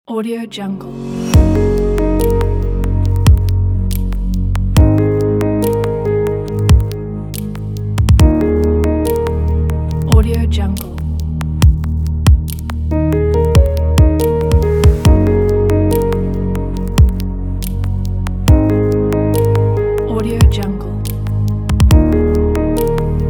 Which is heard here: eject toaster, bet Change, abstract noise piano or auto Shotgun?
abstract noise piano